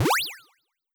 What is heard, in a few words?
jump low